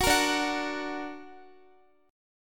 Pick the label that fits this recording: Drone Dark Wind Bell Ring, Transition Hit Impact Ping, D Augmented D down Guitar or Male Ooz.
D Augmented D down Guitar